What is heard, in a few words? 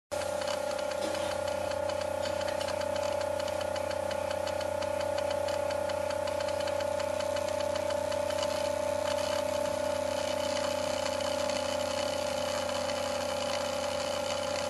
hdd ok